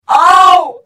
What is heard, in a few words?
Hit; The Elder Scrolls III - Morrowind